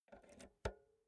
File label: insert jack multi socket slow